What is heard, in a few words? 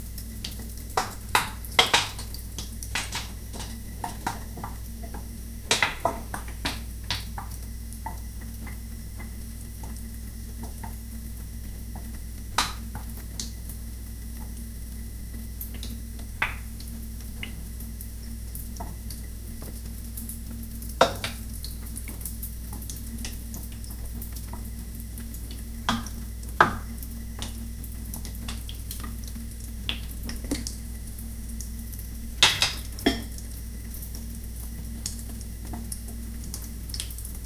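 chef cooking a dish, boiling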